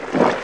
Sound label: out water; quake; american